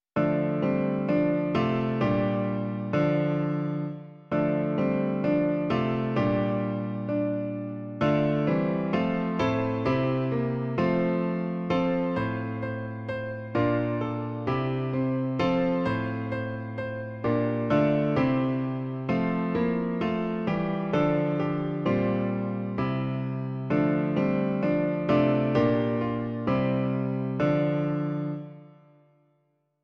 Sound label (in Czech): Ó náš milý Bože - klavír; Klavírní verze